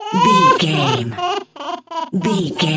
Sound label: goblin fairy cry low